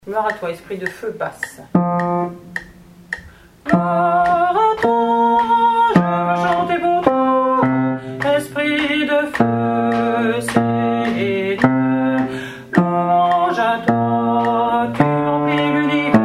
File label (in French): Gloire à toi Esprit de feu BASSE